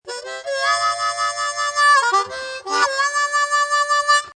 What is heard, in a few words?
HARMONICA; MOBICLIC174